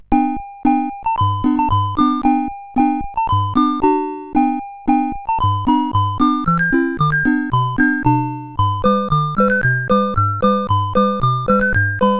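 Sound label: Maxi Golf, MOS 8580 SID chip music, games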